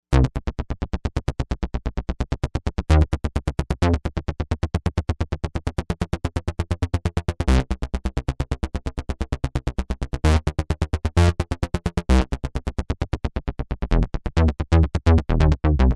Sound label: Deep Trance Bass; Novation UltraNova; Factory Patches